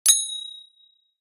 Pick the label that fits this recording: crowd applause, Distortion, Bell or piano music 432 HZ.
Bell